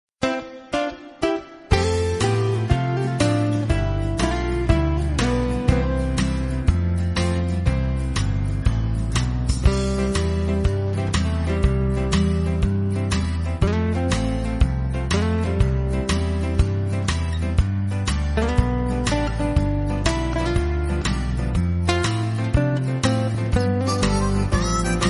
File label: bgmusic, room